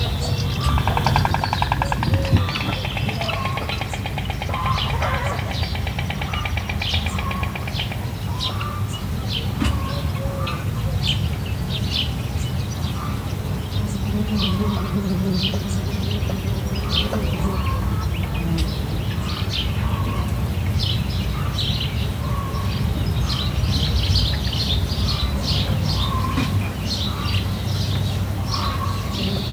távolban kelepel verebek, fehér gólya; Tiszavalk

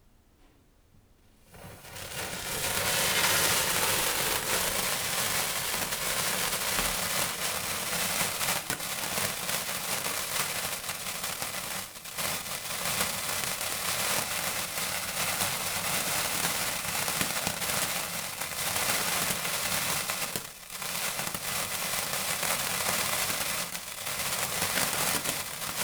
kettő darab, csillagszóró; Perkupa

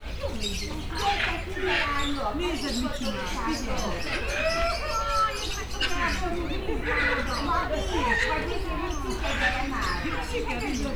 erős hang, látogatók, zöldszárnyú ara; állathangok; nyíregyházi zoo 2010

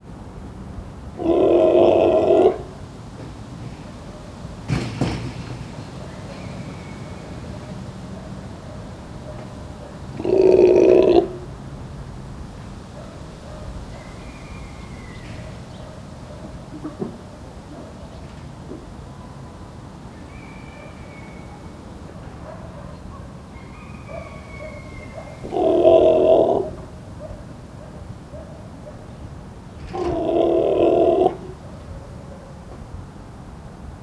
takin, szélvédő; állathangok; nyíregyházi zoo 2010

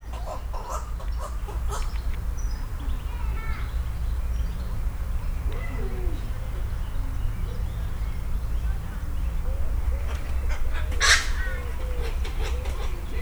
bakcsó; szegedi zoo 2011

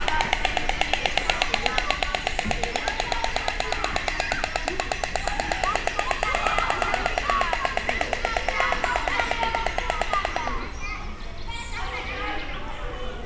gólya gyerekekkel, fehér gólya; szegedi zoo 2011

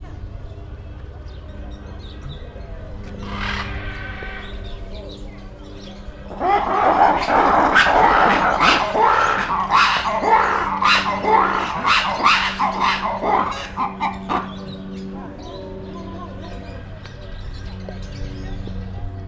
rövid összezörrenés, vari; állathangok; nyíregyházi zoo 2010

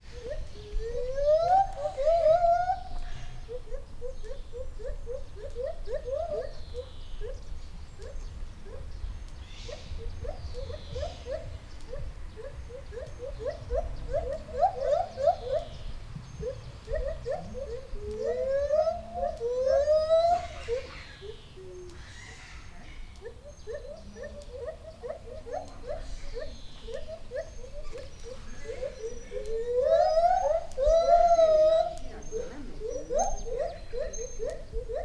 egy csúcsra járatás, fehérkezű gibbon; debreceni zoo 2012; marantz puska

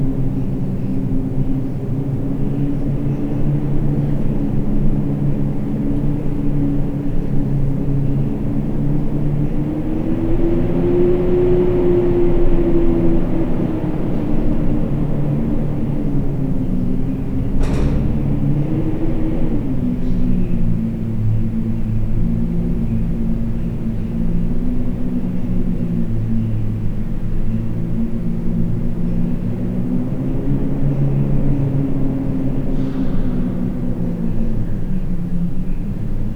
ajto mogul, szel; angyalfold

